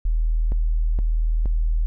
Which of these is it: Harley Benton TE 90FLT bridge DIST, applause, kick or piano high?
kick